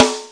solid snare; single hits

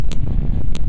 three beat noise clicker; LOOPING AMBIENCE

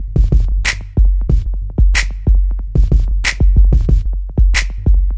offbeat subs crunch n snap clap, 92 bpm; 091 to 100 bpm

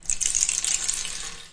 rainstick trickle down; ETHNIC and WORLD PERCUSSION; Rainsticks

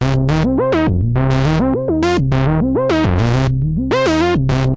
SH101; SYNTH AND ELECTRONIC

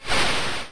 lofi sega sample; LO FI and 8 BIT KITS; Lofi Sega Samples

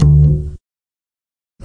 Upright Bass Notes; multisampled

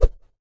kung fu staff, Kung Fu Staff Swings

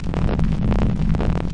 grindy loop; LOOPING AMBIENCE

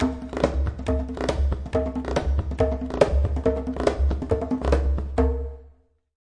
139 bpm epic congas

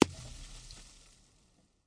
snowball brick, Throwing Snowballs; Throwing Snowballs Kit

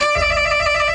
orchestra string hit D

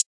Twisted Hat; drums; hip hop; STYLE KITS (Hip Hop, Etc); Khezie Hip Hop Kit

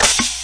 snare

